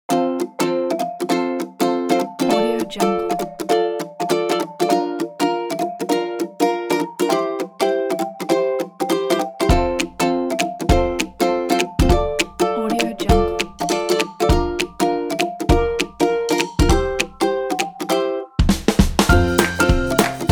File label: the happy upbeat